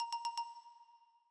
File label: nokia sms, midi tones